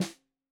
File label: Snare 65 Reg Stereo, Deeply Sampled Drums